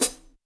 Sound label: closed high hat sound f sharp, Royality free hihat tuned to the F# note; hat samples